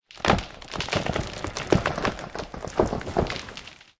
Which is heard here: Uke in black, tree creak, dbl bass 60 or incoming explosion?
tree creak